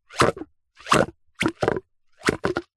foley liquid pipe plastic flexi gurgle short bursts water movement inside